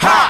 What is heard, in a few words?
ha, group of people; ooh ahh